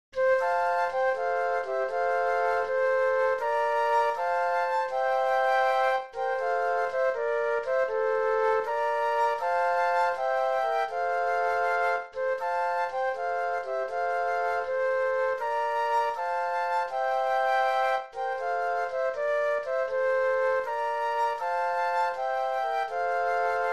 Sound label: Flute Trio; christmas